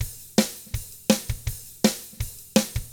ROCK, Drums; ILIO; Double Platinum Drums 1; 164 ROCK

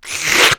SCHLURP; Rarefaction - Poke in The Ear With A Sharp Stick

